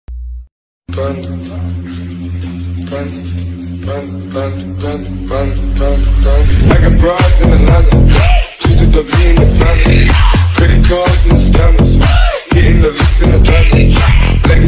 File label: attitude ringtone